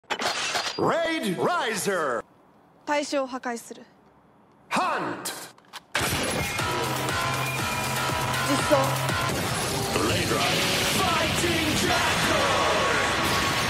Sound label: Fighting jackal